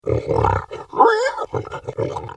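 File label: Oink coo, cucù con gli animali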